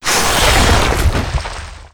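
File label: flower spawn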